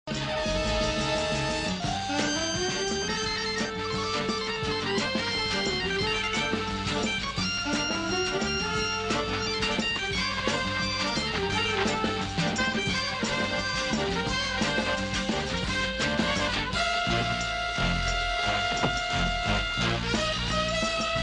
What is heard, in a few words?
music